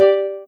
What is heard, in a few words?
soft hitwhistle; osu